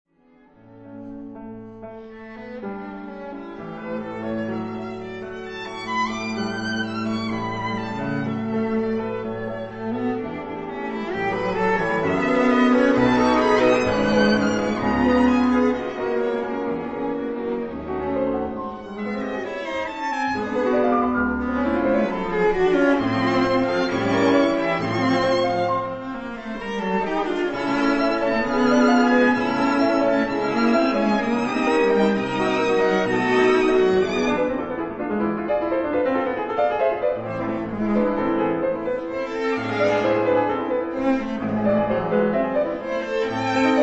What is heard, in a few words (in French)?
Quatuor piano; Paris : Heugel, 1946